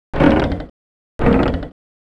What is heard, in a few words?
Cannon; Cannon Time